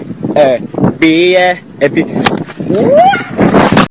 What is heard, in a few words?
falling